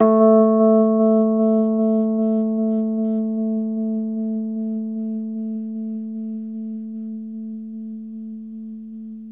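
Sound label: Rhodes, A2, left; 3D Sound; 3D Keys VOL-1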